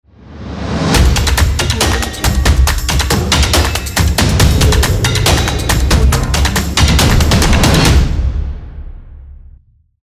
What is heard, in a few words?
promo logo